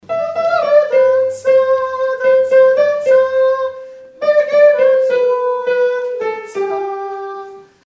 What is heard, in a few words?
Bi bi sop